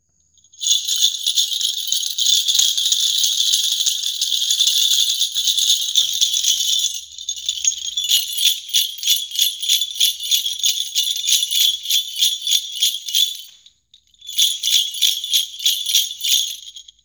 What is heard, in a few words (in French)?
Vocal grelots; vie de l'ecole